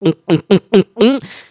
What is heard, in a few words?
drink